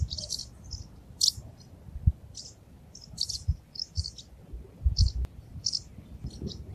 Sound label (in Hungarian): madarhangok molnárfecske Delichon urbicum